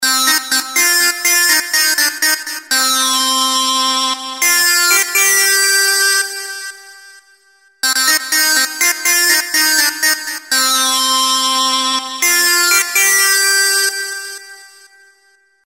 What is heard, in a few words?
synclead